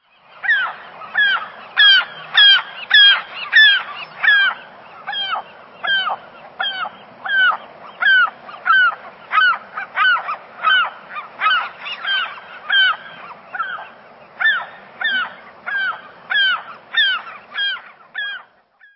Western Gull; Birds

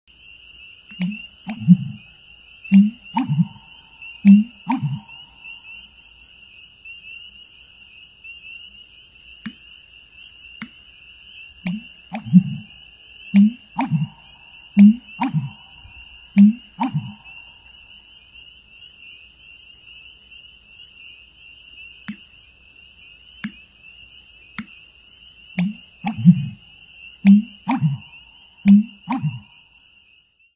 American Bittern; Birds

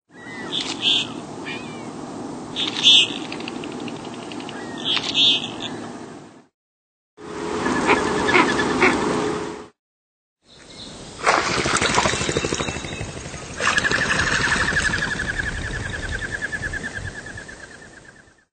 Common Goldeneye; Birds

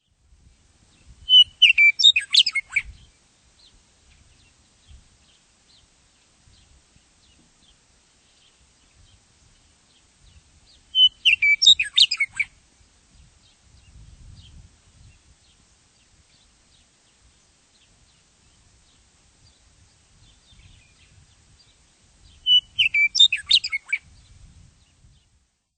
Western Meadowlark; Birds

